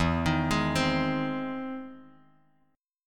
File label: E Augmented Major 7th E down Bass Standard; E+M7 arpeggiated; JGuitar